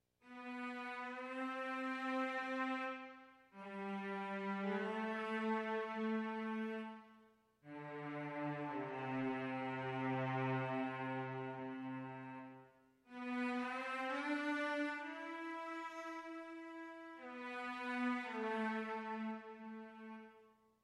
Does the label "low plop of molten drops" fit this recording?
No